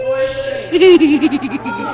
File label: hehehehehihi